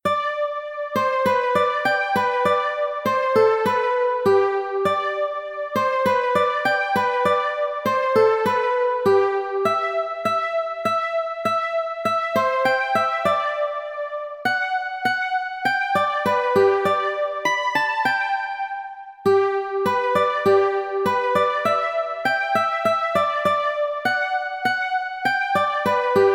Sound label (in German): Als wir jüngst in Regensburg waren G-Dur 100bpm, Volkslieder